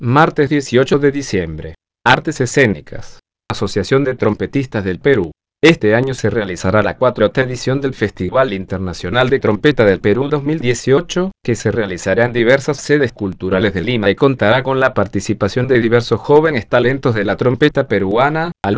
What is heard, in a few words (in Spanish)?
trompetistas